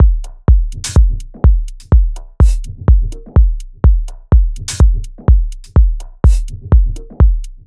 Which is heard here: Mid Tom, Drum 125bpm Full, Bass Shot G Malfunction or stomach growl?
Drum 125bpm Full